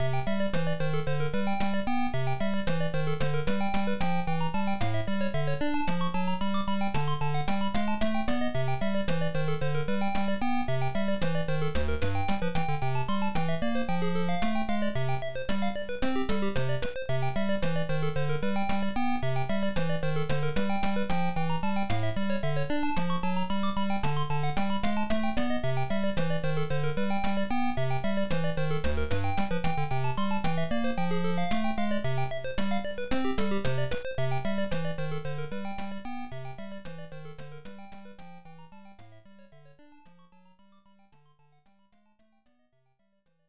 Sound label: Round BGM; super boy 3; msx